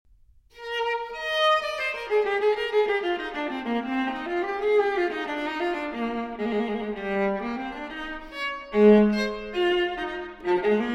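Cello Suite No. 4 in E-Flat Major Transcription For Viola Allemande; J.S. Bach; BWV 1010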